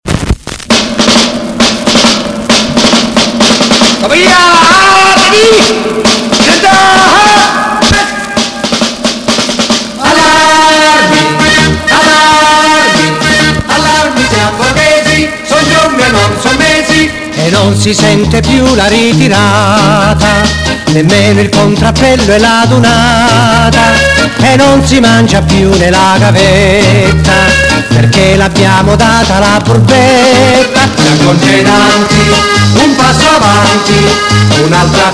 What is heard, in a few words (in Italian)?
musica militare; inno dei congedanti cara burbetta